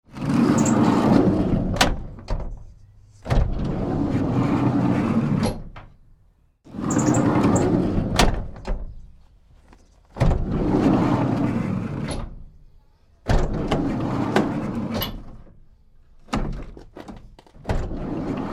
Sliding Van Door, Medium size truck side sliding door - Panel van; Panel van various slides; Metal Doors; Tom Hutchings